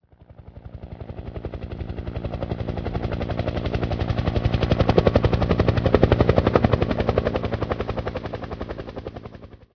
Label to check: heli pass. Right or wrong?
Right